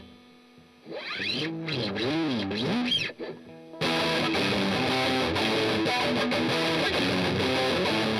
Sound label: Strum Octav